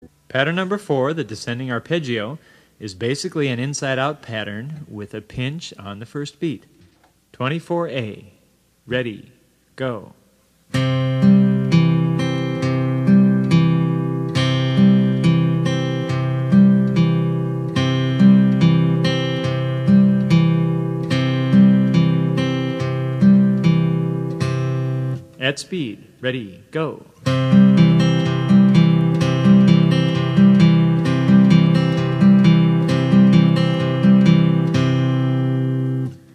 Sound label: travis picking